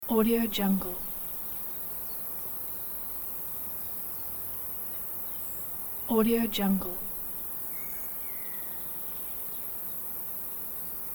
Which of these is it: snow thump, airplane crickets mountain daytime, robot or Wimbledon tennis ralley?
airplane crickets mountain daytime